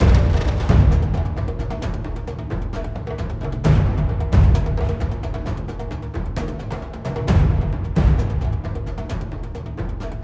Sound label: Fight Theme Perc Stem; ryse son of rome 2014